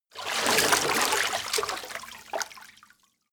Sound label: water strong